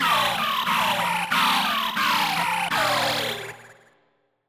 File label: Tympole Choir